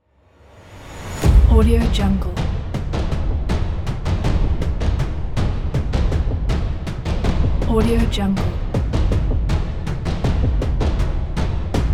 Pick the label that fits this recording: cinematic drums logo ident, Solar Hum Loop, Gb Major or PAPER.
cinematic drums logo ident